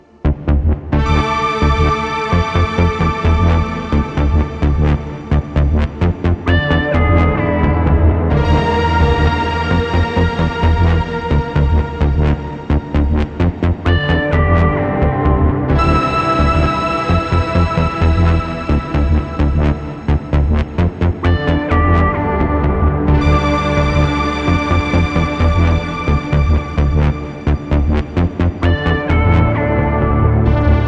No perc Loop, 130bpm; Broom; jenny leclue detectiv

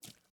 water jump

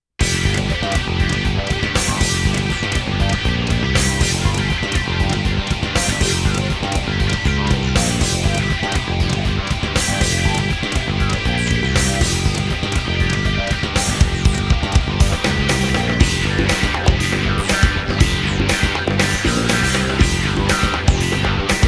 Everyone Funk ~Staff Roll BGM~; Tokimeki Memorial Taisen Tokkaedama Poitter's Point

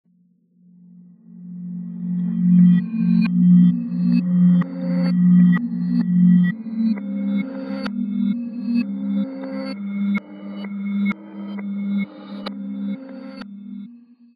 Game over; Five Nights at Ronald's

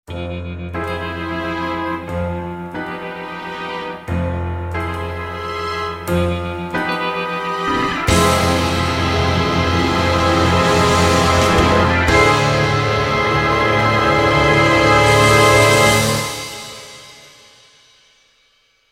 Turn End; Yu-Gi-Oh Duel Monsters GX Sound Duel Vol I

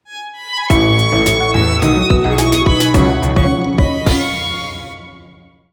Enemy Defeat - Jingle; Touhou Lost Branch of Legend

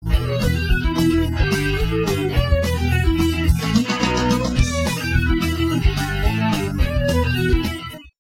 level start; zenith; CD-i

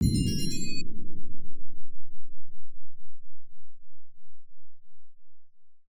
Combo Ab2db Low; Mixolumia

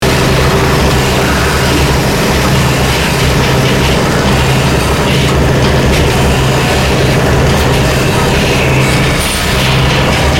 Heavy Machinery Layer One Only

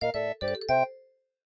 MIDI Animation stings

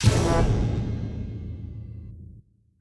Endsting; Fortune Paper Plane Intro; Tearaway Unfolded; PS4 gamerip 2015

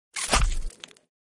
Web Hit; Secrets of Magic: The Book of Spells

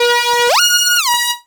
Beat

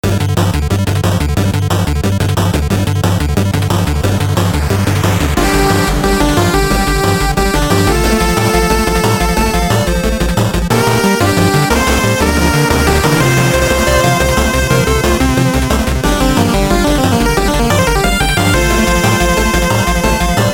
Fighting Spirit; witchcrafty ps vita ps4 ps5 switch windows xbox one xbox series xs gamerip 2022

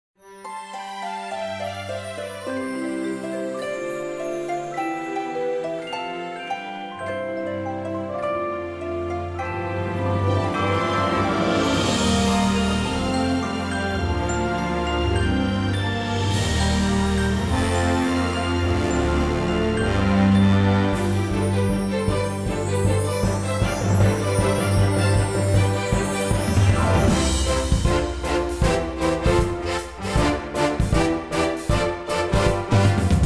music mb harmony; My Little Pony

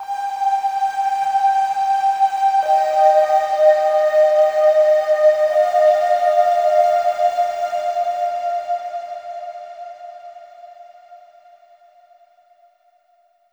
Overlay Tone; Act1 Downtown

